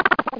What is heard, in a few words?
baaa